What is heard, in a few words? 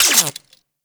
Quill Dart Gun Electric Mech